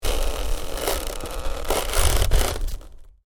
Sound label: Ice: creak of ice under pressure; Snow; Weather; Snow Sound Effects; Stuart Duffield